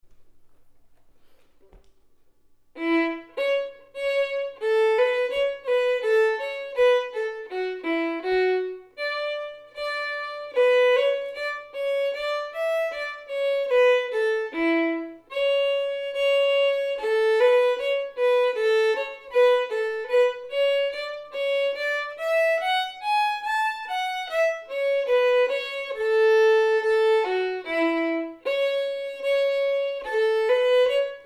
Bill Cheatham slow

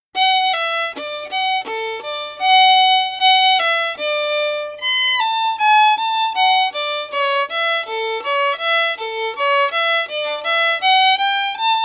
Levintines Barrel slow

